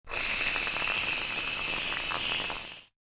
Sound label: hair mousse